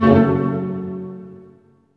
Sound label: HIT, Tutti Hits; Roland L-CD702; Dynamic Orch